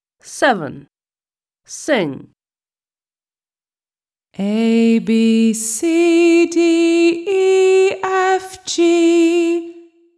song ABCDEFGHIJKL; American; My alphabet book; Smart Book 1

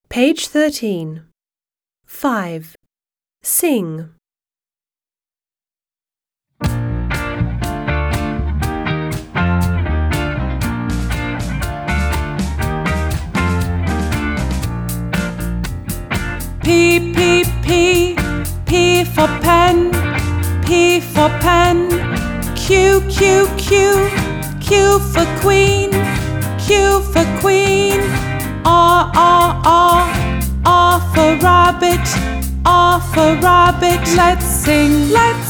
British song P to R; My alphabet book; Smart Book 1

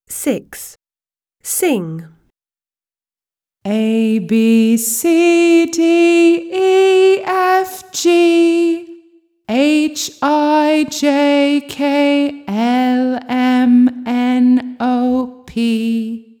song ABCDEFGHIJKLMNOPQRSTUVWXYZ, British; My alphabet book; Smart Book 1

